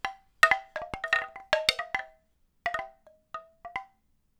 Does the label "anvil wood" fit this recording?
No